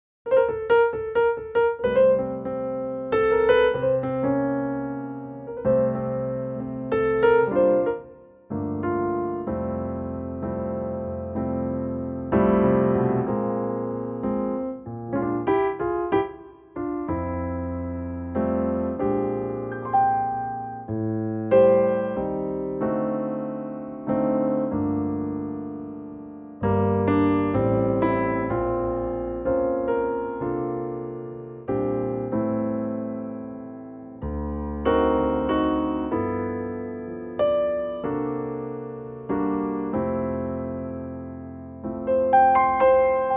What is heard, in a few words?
F piano